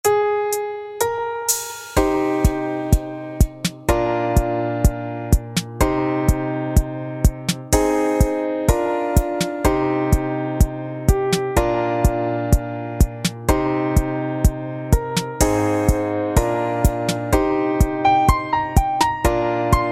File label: House